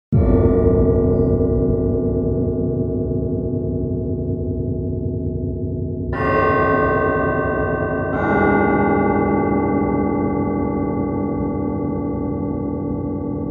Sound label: Horror ansyou